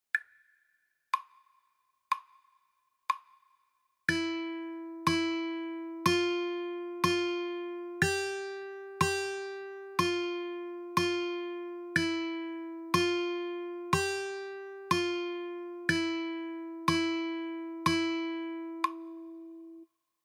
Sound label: 1st string quarter, guitar